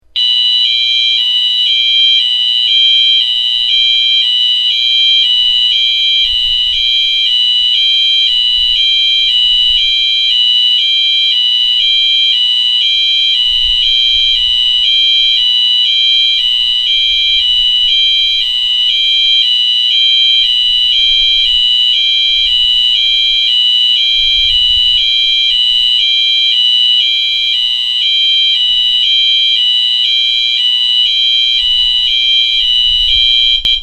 wheelock mt4 115 wh hi lo

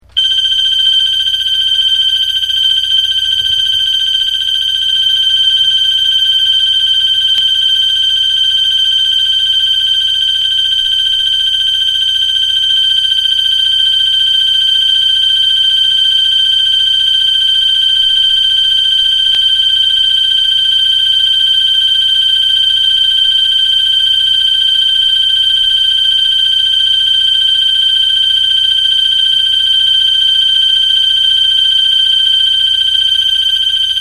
wheelock mt 24 lsm bell, alarm

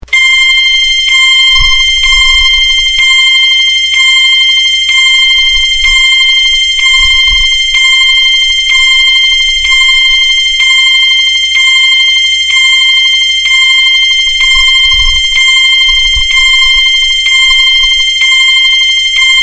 cerberus pyrotronics mtl s15 s vibrating chime

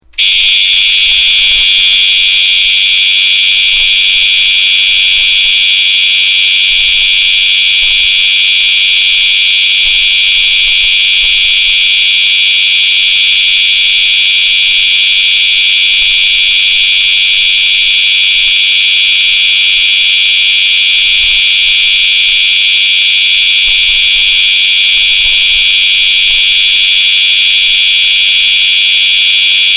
cerberus pyrotronics mtl s15 s horn, alarm

